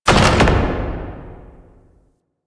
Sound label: Music Mall PA Stop; Warframe; NineteenNinetyNine; Stage6TheCatCameBack